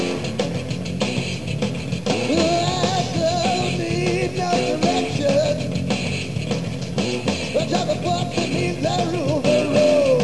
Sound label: bus song